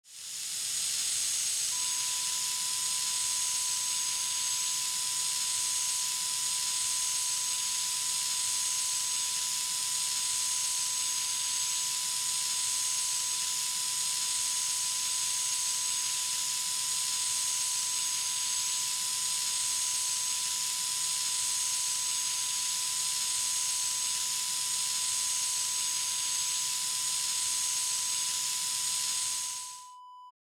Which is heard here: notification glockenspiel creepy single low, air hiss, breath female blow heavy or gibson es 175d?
air hiss